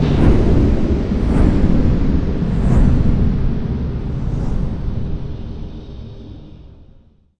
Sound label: fan off; CounterStrike; cstrike